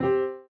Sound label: PUZZLE Success Piano Two Note Fast Soft Dry; stereo; Universal Sound FX